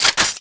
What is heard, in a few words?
reload, weapons; valorant; prime vandal